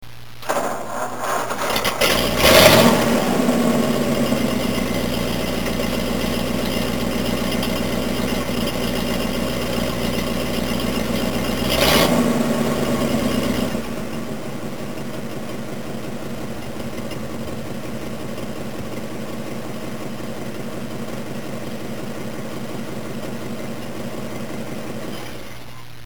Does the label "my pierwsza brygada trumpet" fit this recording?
No